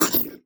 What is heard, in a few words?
Multimedia Internet CD-Rom Flash Blast